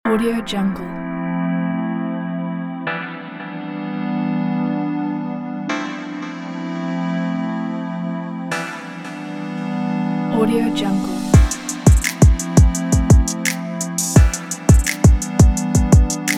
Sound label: positive funky rnb; singlefx